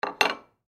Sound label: China Cup Set Down On Table; Set Downs; Objects; Blastwave; Foley